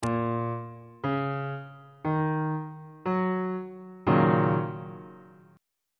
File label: f sharp major 6th 1st inversion on bass clef